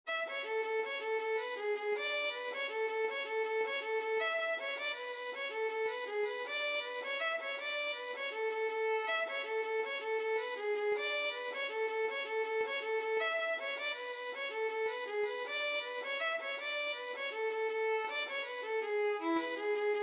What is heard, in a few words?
violin